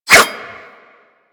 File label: Dagger Whoosh; Warframe; Weapons; Dual Daggers